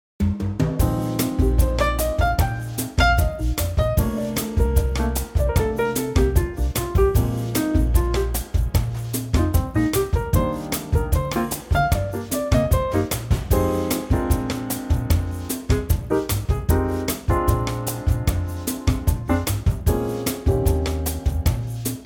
Eb Bossa

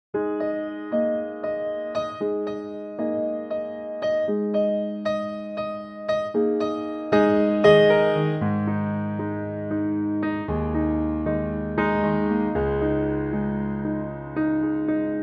Ab piano

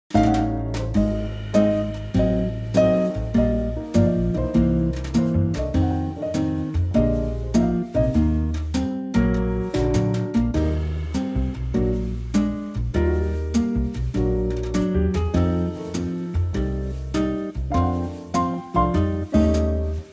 quartet Eb

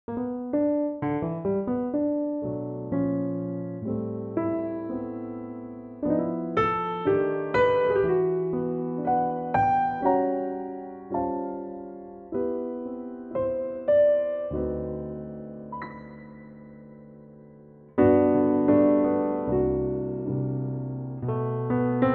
G piano

